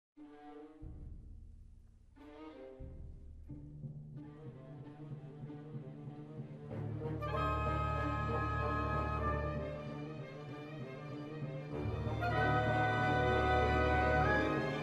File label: Piano Con